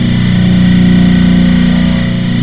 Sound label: TRCTR LP; XWINGTIE